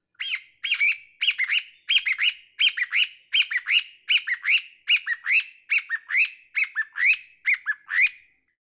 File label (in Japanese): kojukei